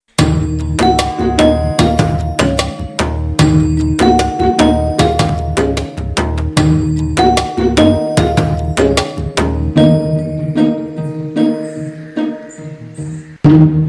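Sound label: love background music ringtone; paruthiveeran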